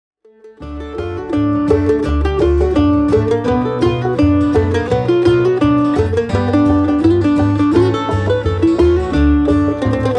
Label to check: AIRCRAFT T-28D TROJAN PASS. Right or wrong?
Wrong